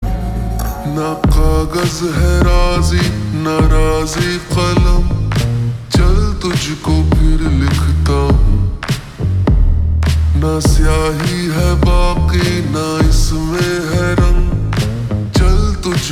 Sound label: Slow Reverb Version Ringtone